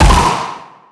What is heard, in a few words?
Tennis Racket Sound; Peach; SSB4; SSBU